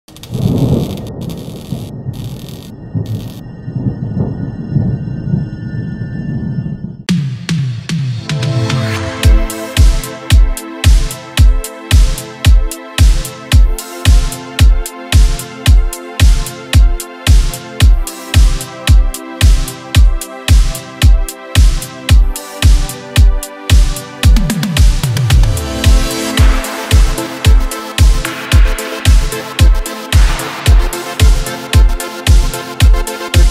euro dance italo disco

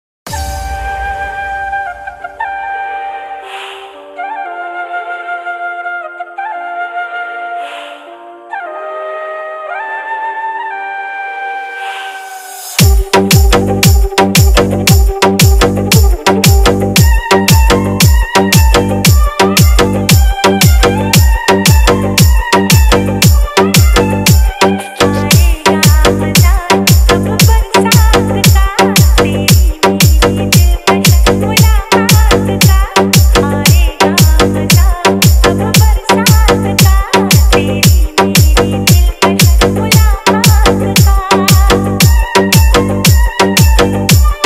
hard bass remix

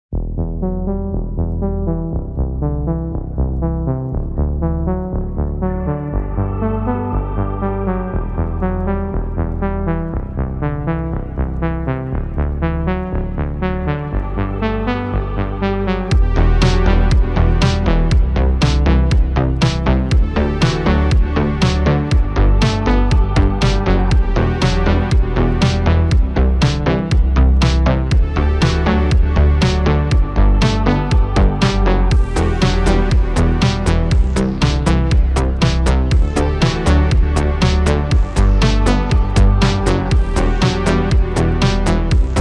80's synthwave retro

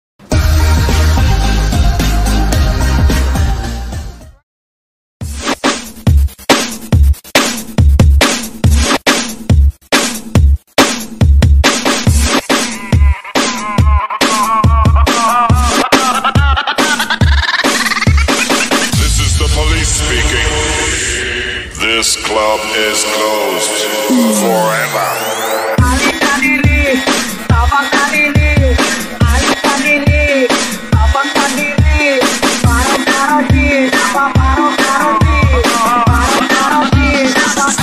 music loops emergency paging

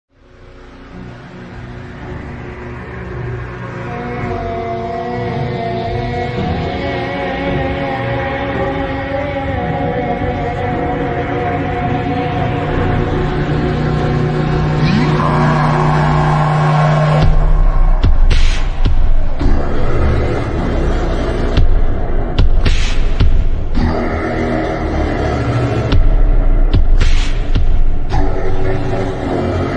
ultra slowed reverb

